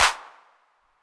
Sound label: Clap 808 Saturated B; Hand Clap; Multi Saturated